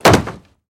Body Falls On Crate

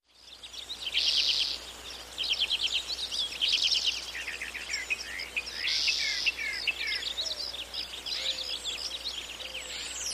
Birds Chirp Close, forest

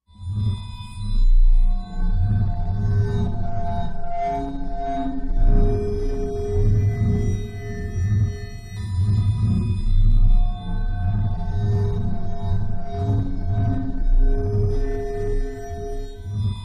Warped Random High Low P, metallic

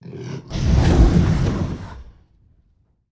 Dragon Roars Breaths

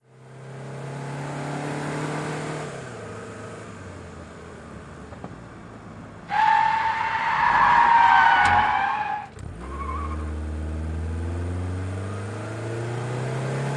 Sedan 180 Skid Mix

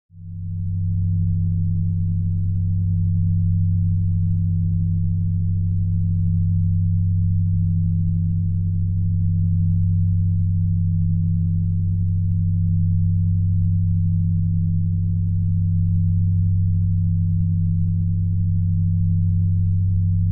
2 Tone Warning Alarm

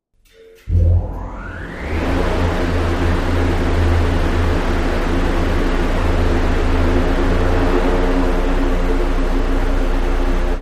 Power Up Large Wind T, factory

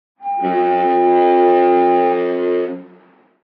Ship Horn Shrt Blast

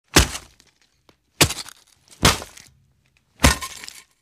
Gun Butt Hits, flesh

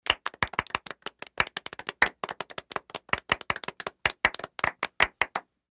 20 People Clap

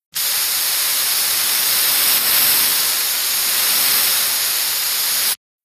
Aerosol spray various, aerosol can; FOLEY PROPS AEROSOL CAN: INT: Aerosol spray various.

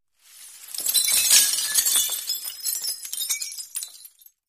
Glass Falls Medium; windows & mirrors

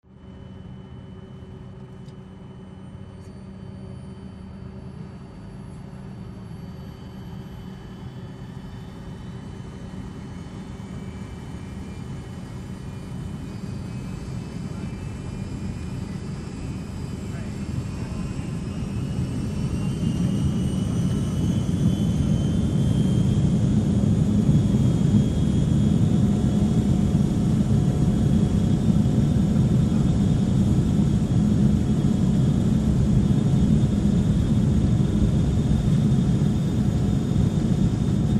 707 Int Takeoff

